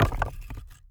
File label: Sample Drop; Panel Sort